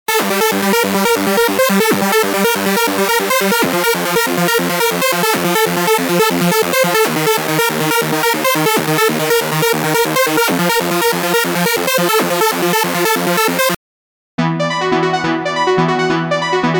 Trance & Psytrance Full; unisynth

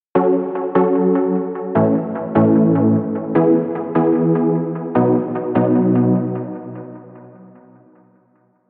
Chords Rhythm Hardstyle Hardcore; midi wizard

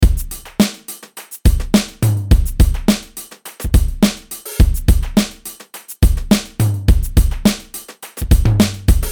Folk Indie 105BPM

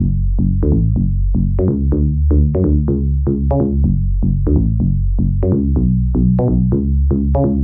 House Deep House

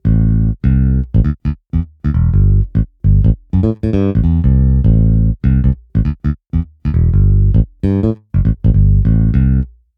C 100bpm; Jibe

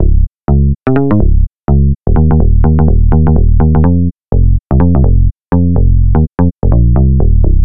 Disco

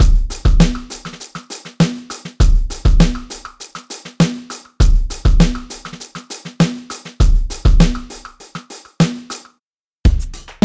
Drum Folk Indie; Drum Monkey; Unison MIDI Wizard Plug-In; Drum Demo

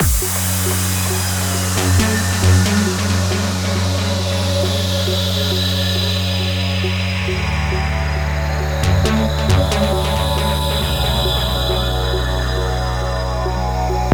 Psytrance; Unison

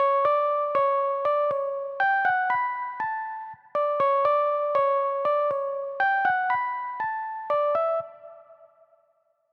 Melody Lead Soul; midi wizard

